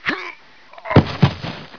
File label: die, player